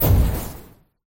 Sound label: robot footstep, machine